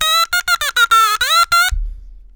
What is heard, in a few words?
BLEEP; ICECREAMFACT; BLEEPLER